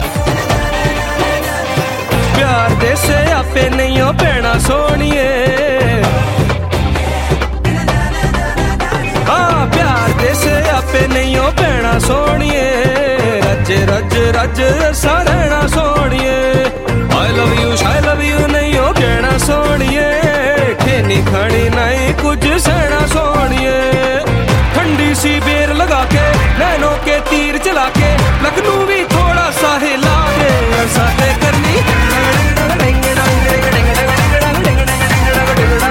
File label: 130 BPM